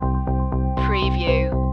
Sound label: positive vibe stinger